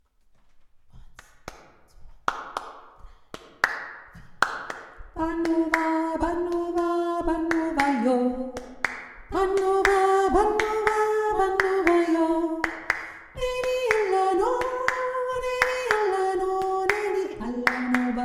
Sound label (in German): das lied einstimmig